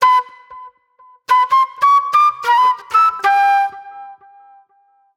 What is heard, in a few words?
Sharp Delay Flute